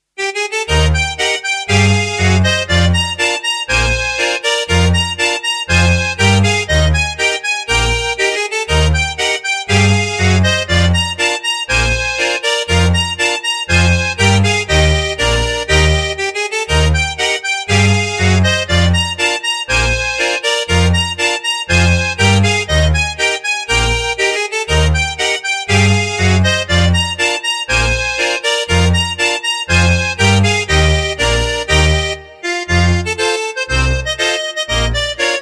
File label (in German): Polka